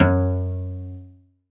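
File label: SOLO CELLO, Synclavier Strings; ILIO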